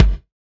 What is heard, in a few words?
kick, Hardcore Breakbeat; Big Fish Audio; Hardcore Breakbeat Action; Riddim